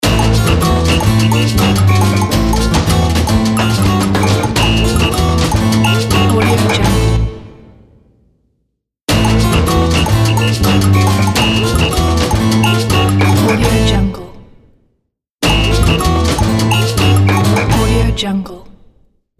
brazil cuica logo

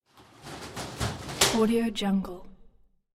doors sliding concertina shower

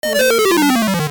8-bit game over

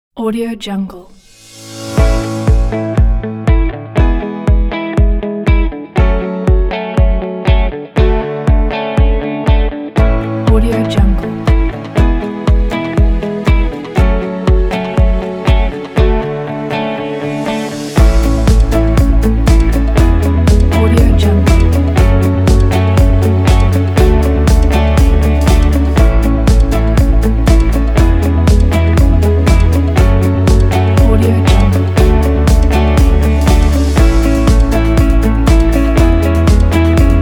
upbeat motivational inspiring corporate

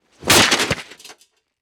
armor; prosoundfx

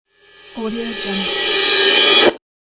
reverse cymbal whoosh

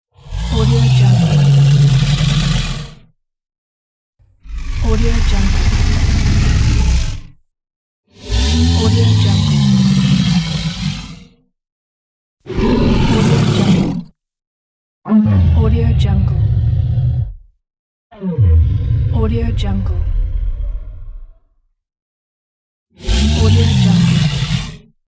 dinosaur

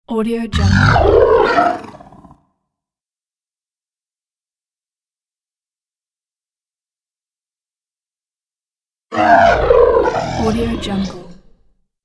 alien creature voice pack

